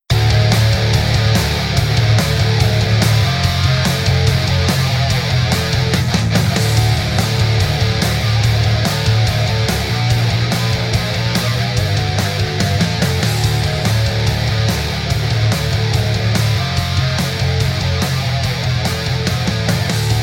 One Shot rock version; Super Mega Baseball Extra Innings

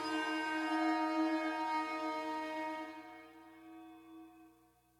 Ambient End; The Desert; prince of persia 2008 gamerip

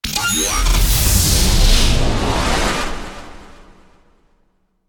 Field Mat Tap; Yu-Gi-Oh! Master Duel

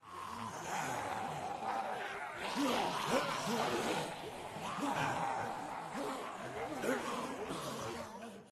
Zombie Agressors

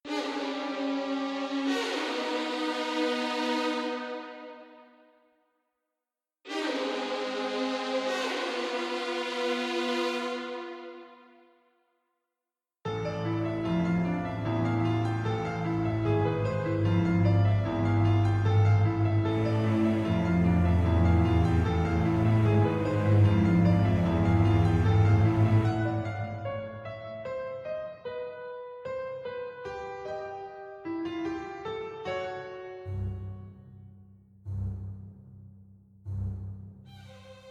Pale Horror

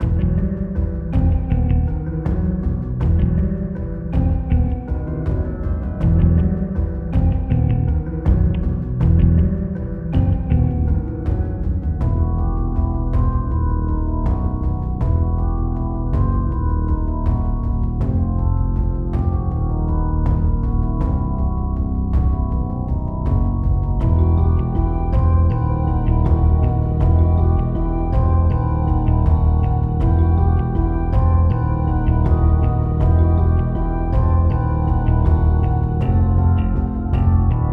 stage music; primal light linux macos switch windows xbox one xbox series xs gamerip 2020

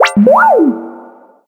Droid Chatter; Zombie Catchers

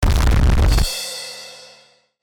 Tetris Lose; Chuchel

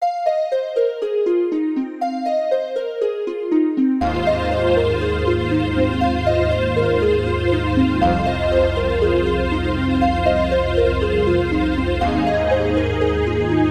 Planetary Ambience; midi version

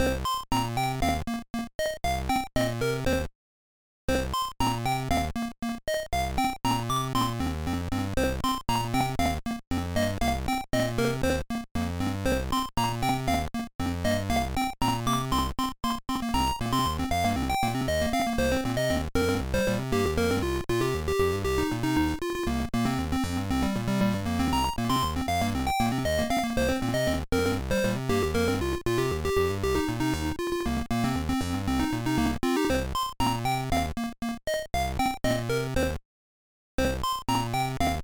BGM; card party 2002 gba

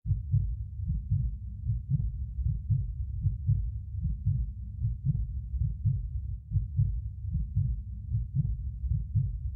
HeartBeat; children of morta

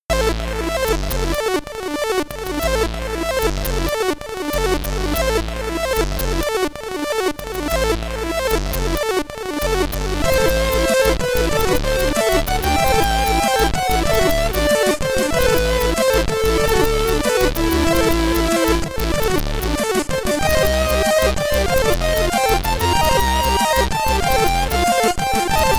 High Score, SID Stereo; Crystal Fever; Commodore 64

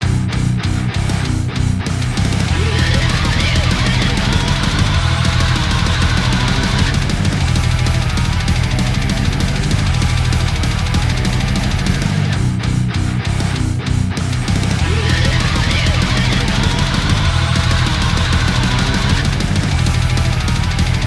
deathmetal; z collabo character; z collabo story

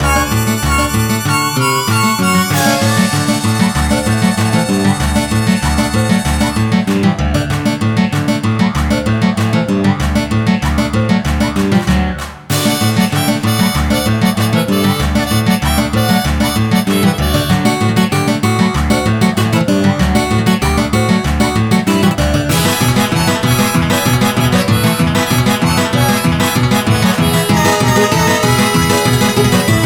MUSIC SPEED

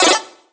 MENU VALIDATION

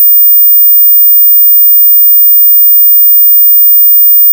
Hz 15 kHz Sine Wave Sound Frequency Tone

